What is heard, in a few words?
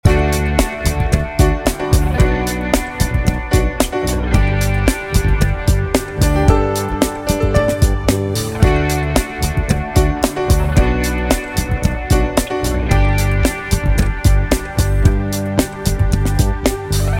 Loop